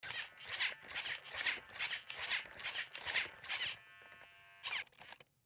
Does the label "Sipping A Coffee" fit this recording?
No